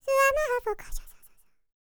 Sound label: HIMALAYAN, World Voices; ILIO; Vocal Planet VOL-5